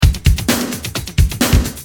Tribal, Drums Perc; Industrial

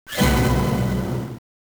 Orchestra Hit, Genesis; M8

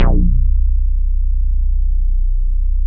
STEREO BASS, Bass Analog & Synth; AKAI; USB Soundscan vol.12

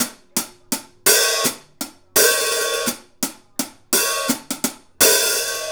Hi Hat Pattern

